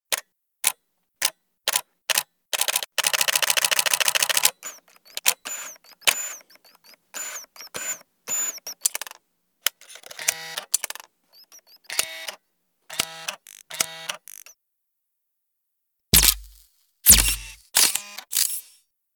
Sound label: camera